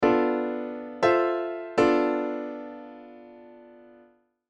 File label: Locrian; Modal Harmony